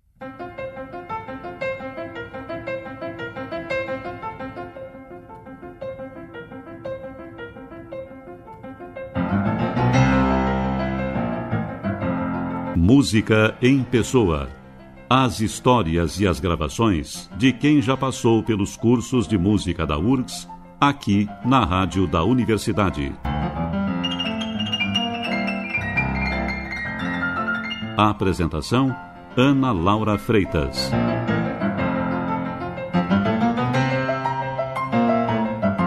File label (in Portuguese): Musica de Camara; MUSICA EM PESSOA; Musica de Camara Autoral